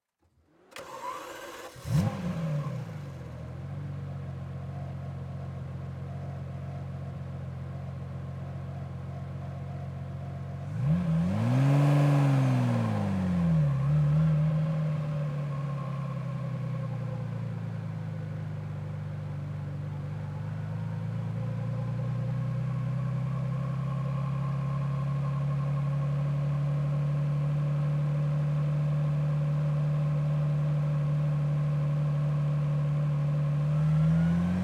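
Ferrari, Testarossa, Onboard, Start, Drive, Steady RPMs, Engine; Ferrari Testarossa 1990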